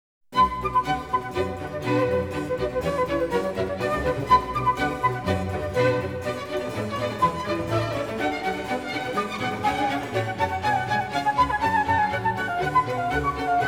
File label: Suite for Orchestra No 2 in B Mino; Suite for Orchestra No 2 in B Minor